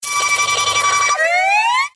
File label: Cry; Kricketune